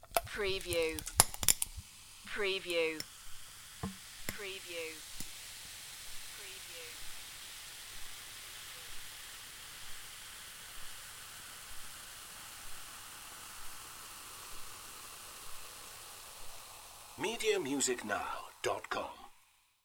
Pour Soda Water, Fizz Of Carbonated Drink Into Glass With Ice Cubes